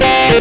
xtra lead

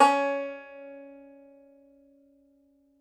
Tenor Banjo, Plucked Strings

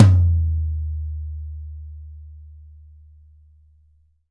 YAM 14X14, Drums; Big Fish Audio; Ross Garfield Drums VOL-2

